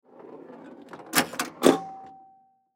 Pinball Ball Roll, Pinball Ball Roll Down Hit Bumpers Ding; Arcades; Sports; Blastwave